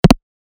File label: move